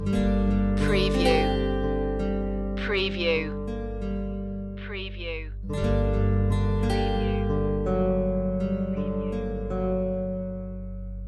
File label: beneath the surface loop